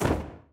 chase flap; fallen

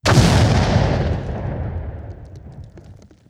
item explosion; gfuel

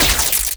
red lightning medium

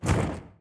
flap; fallen

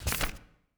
menu note appear

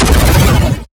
thumbs down amplified

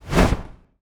angel wing

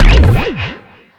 jelly bounce

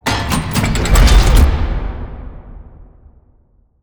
close railroad track raise

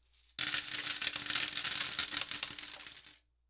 coins falling; greed

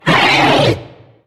small growl; steven

